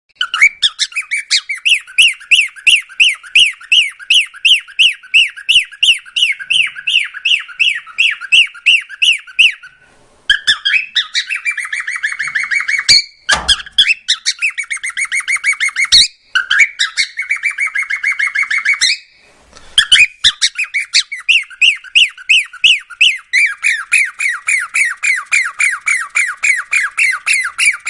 Male Dutch bride voice; عروس هلندی نر

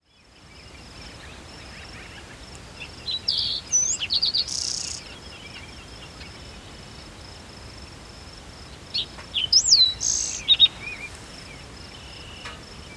Green tailed towhee; پرنده آدم گریز دم سبز

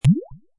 water drop

